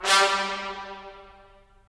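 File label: brass trumpets staccato; Roland LA Composer vol. 4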